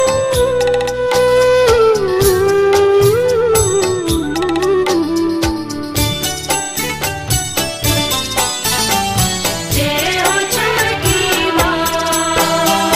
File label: Instrumental Music